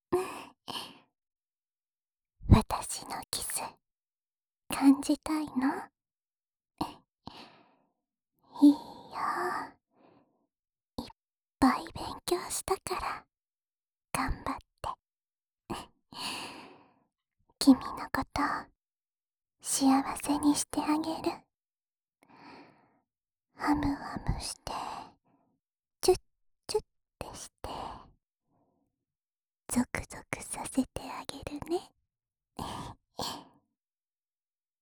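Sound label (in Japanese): 甘くちゅっちゅしてあげる……♪（浅い左の耳舐め); 沙奈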